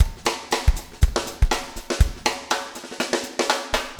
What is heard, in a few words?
breakout 120, 120bpm, loops